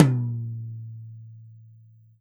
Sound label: 8 tom; E-MU Producer Series Vol. 7 – Old World Instruments